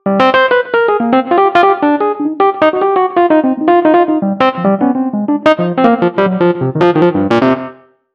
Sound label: Rhodes Solo, Cinematic Funk; Sony Creative Software; Urban Tone Poems; Some Water